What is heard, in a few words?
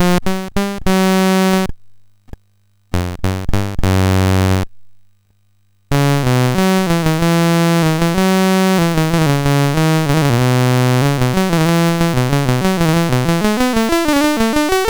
sid MOS6581R4; Willow